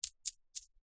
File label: foley money coins small x4 set down into hand stack; zapsplat